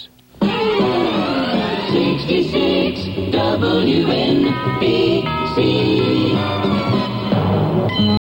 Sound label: wnbc chime